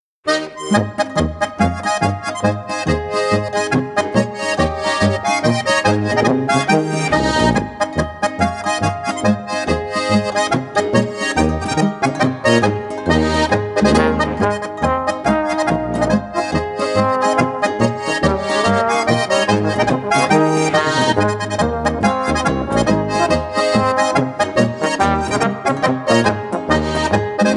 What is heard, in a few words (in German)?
Harmonika